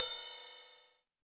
SNARE

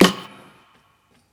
SNR

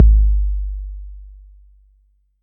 808's

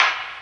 clap reverse percussion

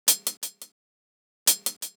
Hihat, Closed Hats

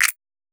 Snap

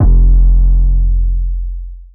808 F; Cymatics

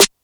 snare trap; Snares

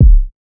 808 Kick; Kicks

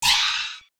Chant

